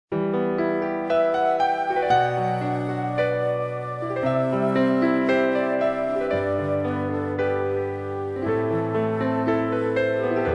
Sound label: classical